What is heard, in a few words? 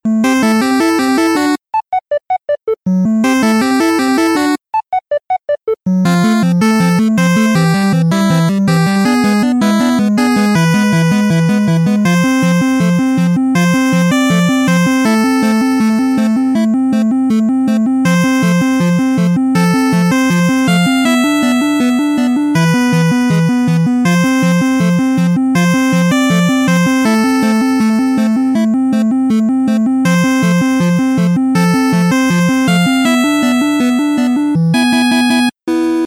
Retro Battle; chigau